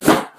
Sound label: Tossing A Thing At Someone